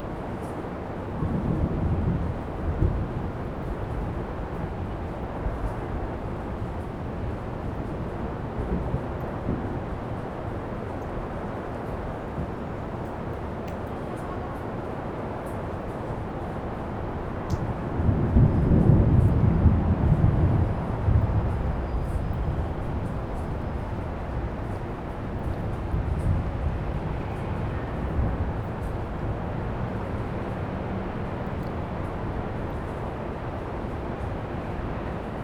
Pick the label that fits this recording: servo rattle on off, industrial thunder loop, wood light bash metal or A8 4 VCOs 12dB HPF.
industrial thunder loop